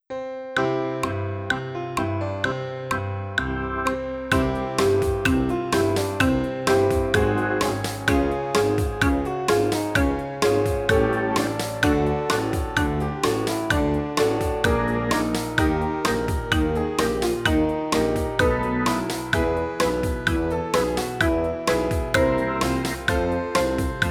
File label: Zing Ah Vocalise Accompaniment; the beatitudes preschool spring